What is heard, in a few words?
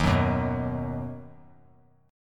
D sharp Minor Sharp 5th D sharp down; strummed; D#m#5; JGuitar